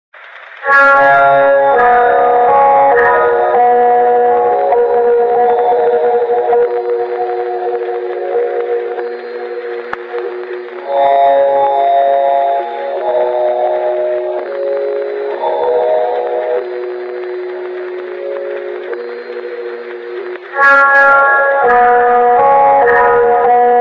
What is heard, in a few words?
low quality horror music; roblox